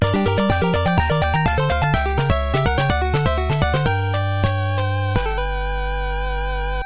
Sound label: game over; castlevania 3